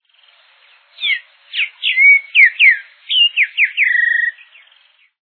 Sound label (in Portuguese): sabia-gonga